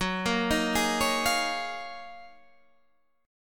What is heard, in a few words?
D sharp Minor 11th F sharp, chord